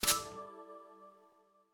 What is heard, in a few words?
Detonator Burst; blek; android; wii u